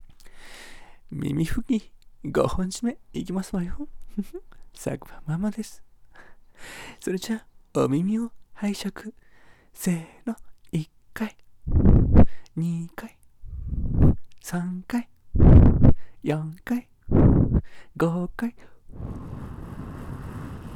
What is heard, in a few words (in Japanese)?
耳ふき5本締め; 解説; 実技版（元気にループ版）; （馬のオネエさん）